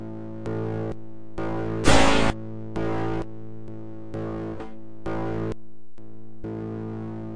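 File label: surprising synth blast, 130 bpm; SYNTH AND ELECTRONIC LOOPS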